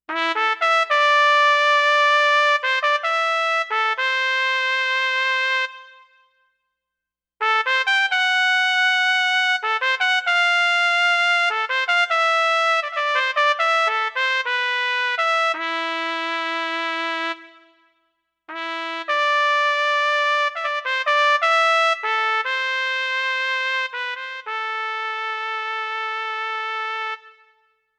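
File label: solo trumpet; Solo TP; JUNO-Di Patch Demo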